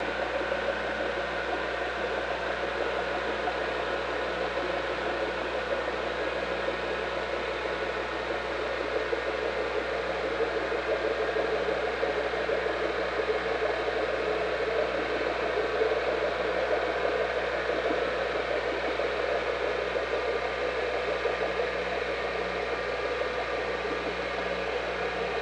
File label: OrcaLab